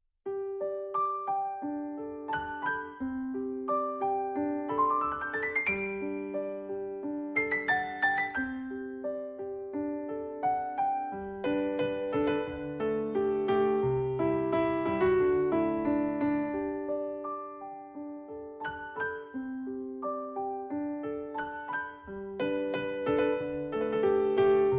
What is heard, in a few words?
Piano Cover